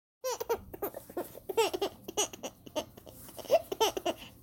giggle

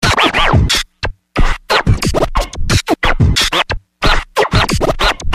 Sound Scratching

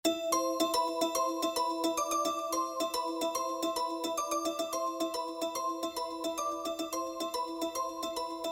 Xylophone

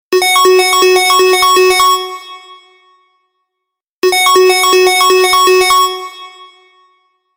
Loud Ringtone

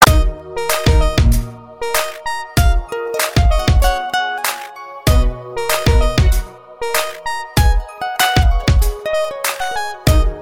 Nice Musik

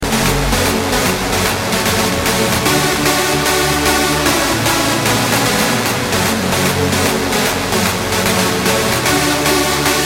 Hardbass

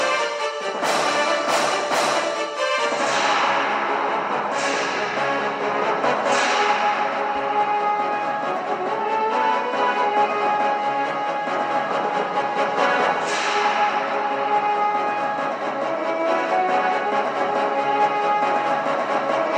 battle song

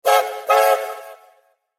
Old Train SMS

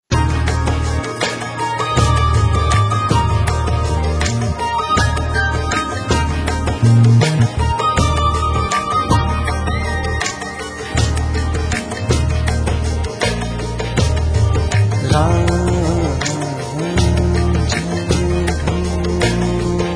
Music

